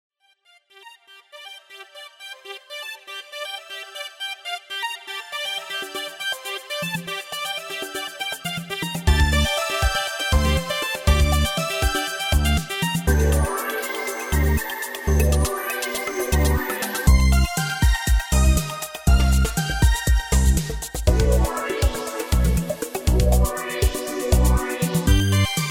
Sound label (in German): die vollsynthetischen 80er